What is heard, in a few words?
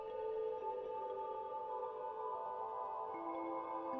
eurorack